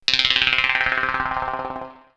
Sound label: Space Harp, sci fi synth fx; X-Static Goldmine